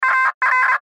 Radio Morse Code; Morse Code Weak Signal End Message Ar; Warfare; Blastwave

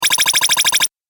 8 Bit Style Health Boost; Video Game

